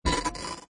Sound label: Button Distortion Electrical Glitch; Scifi Production Elements, Set 2: Button Distortion Electrical Glitch